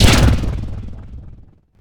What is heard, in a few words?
Pickup Scifi Energy; SFX Kit